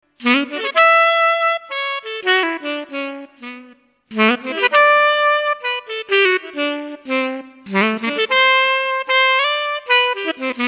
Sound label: sax